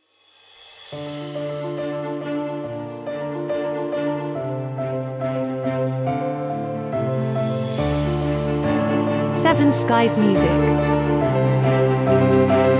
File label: Dramatic Orchestral Trailer